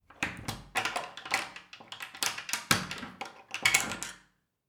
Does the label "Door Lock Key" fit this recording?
Yes